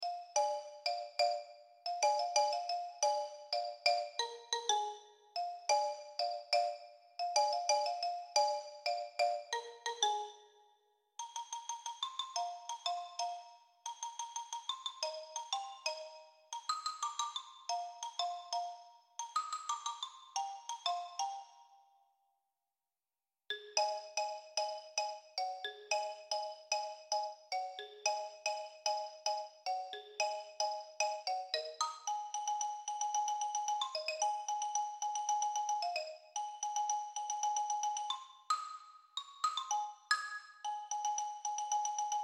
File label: drumline tryout